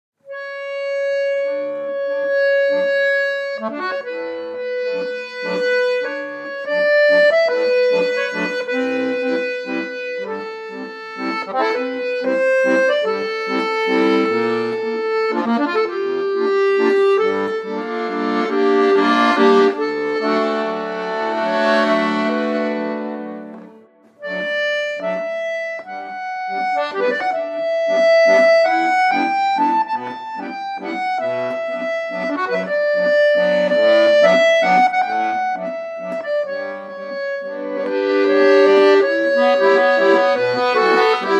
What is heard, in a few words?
Accordeon Version